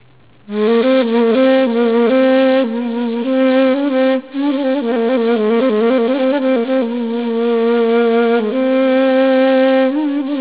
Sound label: SEGAH NEY